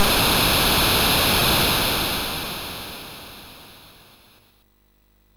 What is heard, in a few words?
sid MOS6581R3; Ryshka